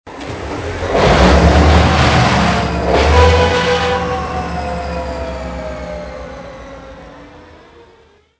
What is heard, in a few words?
train; Soldier Of Fortune II Double Helix; XBOX